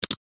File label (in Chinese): 小米锁屏